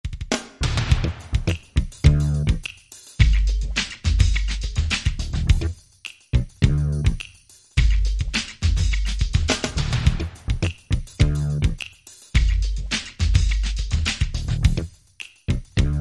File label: Imperfect Way No Melody